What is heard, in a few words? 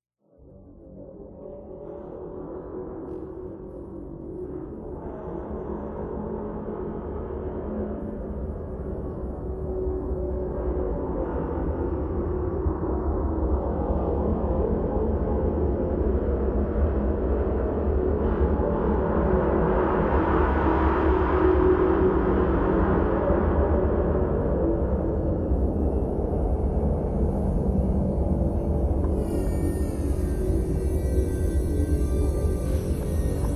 soundscape dark eerie droning menacing horror; Audio Mangler